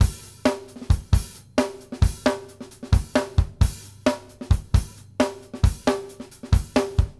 BASIC C L, Drumworks

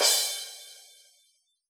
crash cymbal, Yamaha DJX Rock Kit

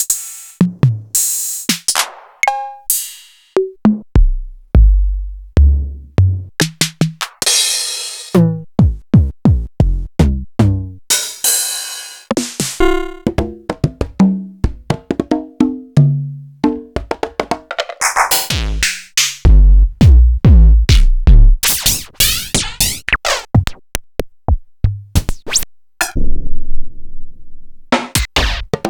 Quasimidi Rave-o-lution 309 dry perc

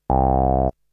Synth; ZERO G - Datafile DISC 3

